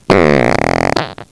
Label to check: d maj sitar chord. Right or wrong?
Wrong